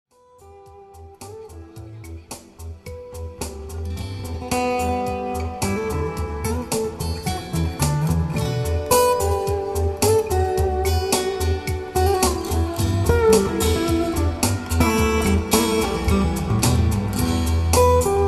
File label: Jazz